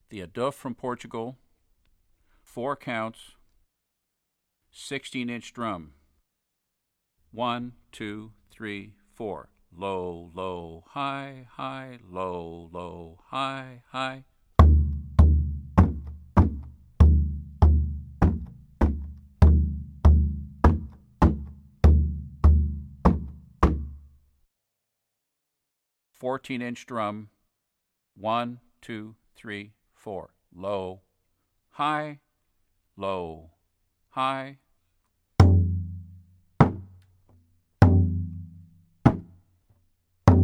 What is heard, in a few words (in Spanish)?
Ritmo passo